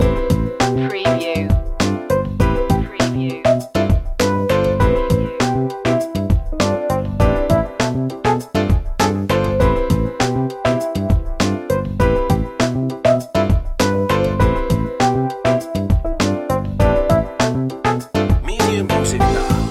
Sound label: loop, jazzy lounge smooth